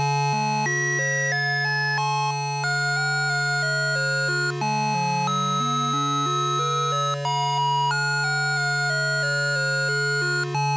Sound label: Lute Song; the black cauldron ibm pcjr